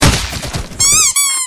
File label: chicken duck